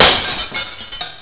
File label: crash; xfce